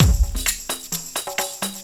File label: 130 tribal, LOOP PERC TRIBAL; Techno Trance; DRUMLOOPS; Ueberschall - Techno Trance Essentials